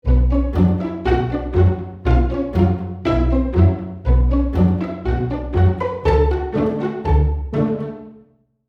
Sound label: Studio Medium, pizz; Hall of the Mountain King; Spitfire